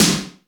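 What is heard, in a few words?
snare drum; Crypton - DrumBase5000; GATE KITS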